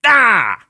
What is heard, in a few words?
pain; awesomenauts